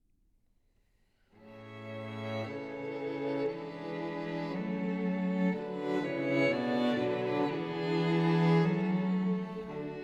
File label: Clarinet Quintet in A major Allegretto; KV58 I.